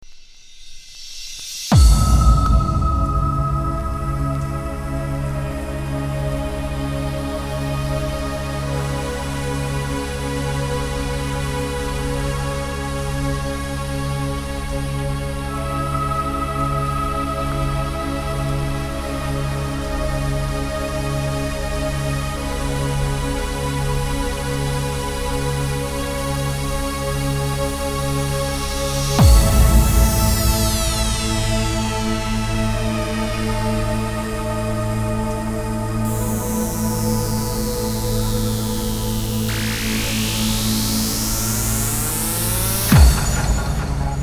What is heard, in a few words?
thunder instr club mix